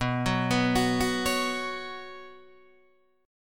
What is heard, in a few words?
B Suspended 2nd, Guitar, DADGAD